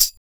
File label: Percussion Tambourine